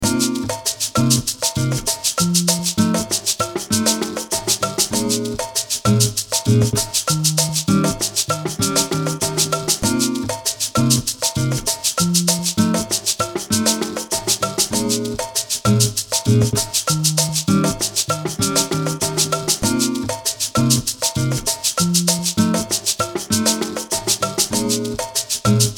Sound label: Cuban Soul; Fusion World Beats